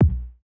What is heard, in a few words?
kick; eddyflux; crate bd; dough-samples